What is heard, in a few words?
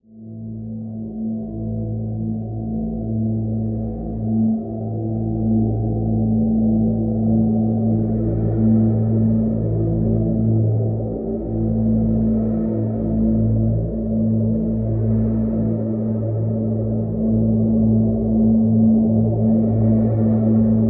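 drone A; Drn Caverns Deep A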